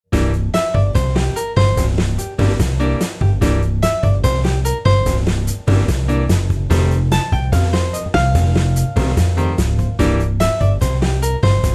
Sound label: Blues; BluesBosaFM; el show de perico; blues salvaje